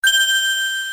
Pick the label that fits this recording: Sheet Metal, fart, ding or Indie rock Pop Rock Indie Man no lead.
ding